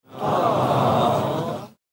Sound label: Aww